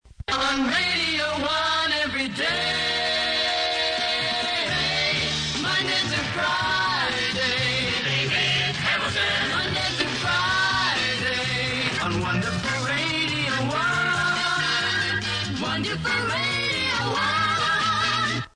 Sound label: mon to fri jingle; david hamilton